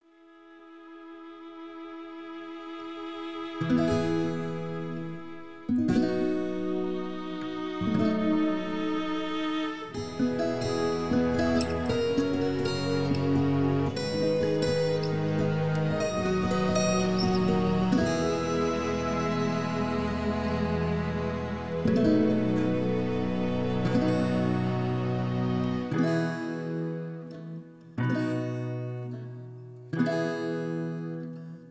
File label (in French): instrumental avec choeurs; ukraine